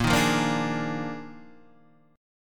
D sharp Major 7th Suspended 2nd Suspended 4th, A sharp, guitar chord